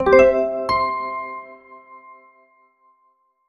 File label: cookie success, sounds; borlabs